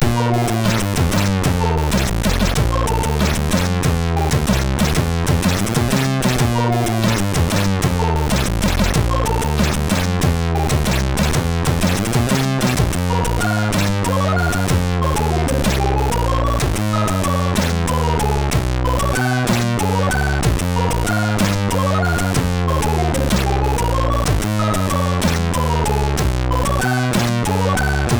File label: sid MOS6581R3